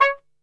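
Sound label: C#3, flugelhorn, brass; Best Service; Brass Super Section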